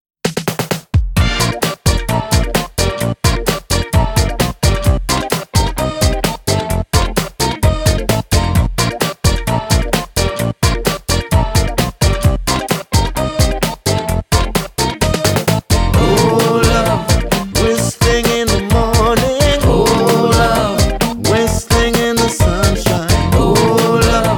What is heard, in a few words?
the whistling song